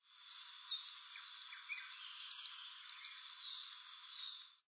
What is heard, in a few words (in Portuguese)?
beija flor de garganta verde